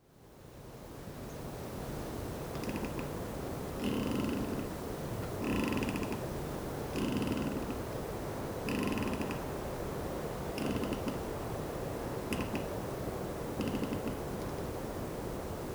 Wilderness Lake

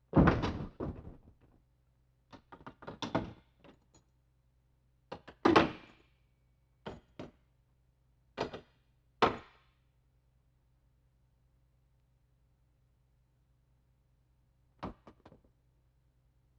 Locks Gated; Blind Man

